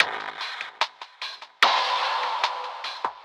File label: HIP NOLOFI; Spectrasonic Distorted Reality 2